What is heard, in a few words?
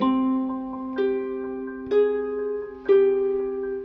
guitar, Loops, Sad